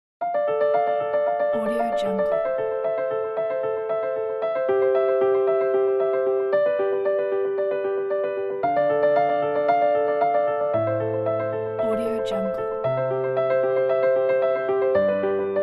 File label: epic adventure trailer